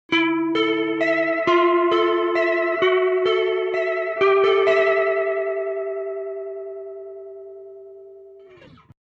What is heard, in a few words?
M 134 Leslie